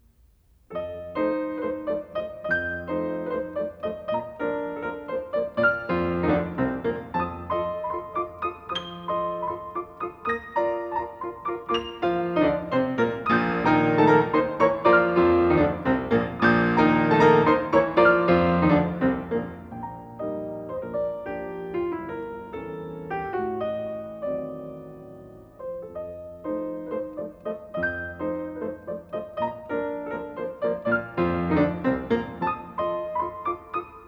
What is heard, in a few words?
Carnaval for piano; Schumann; Op9; Rubinstein